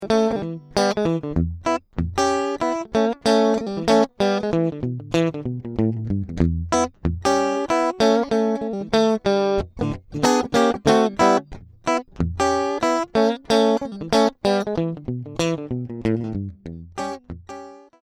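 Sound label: Champion 600 unprocessed; fender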